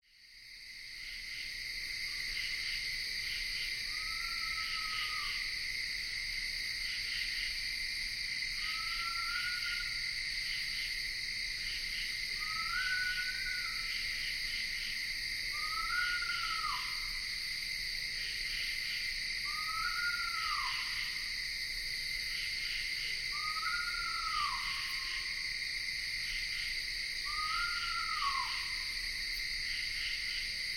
screech owl and insects recorded near ithaca new york